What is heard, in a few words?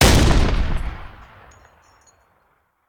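Artillery Cannon Anti Aircraft; Sound Ideas; Guns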